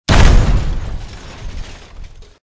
debri explo

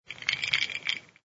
ice moving

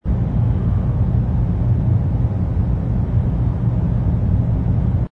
ambience bar space

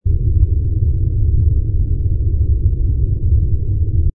rumble liner, engines

